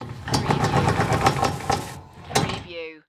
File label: Projector Screen Lower; Sound Effects Bible